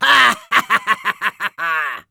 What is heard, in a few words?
laugh evil, es; Demoman